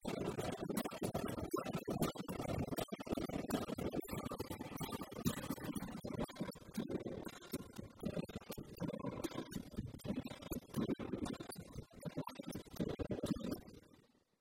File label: Plate